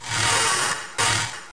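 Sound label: casino door; Sexy Dolls 5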